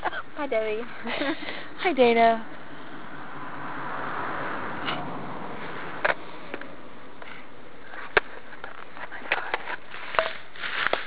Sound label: native american chanting or saying joplin